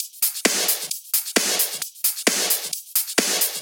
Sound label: Loop, 132BPM, Drums & Perc; G#major